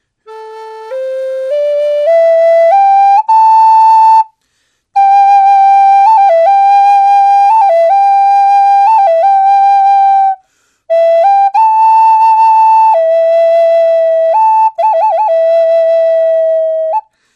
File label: Maple Burl Classic